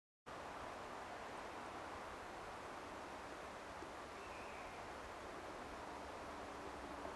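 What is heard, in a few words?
honey buzzard alarm call; tyne valley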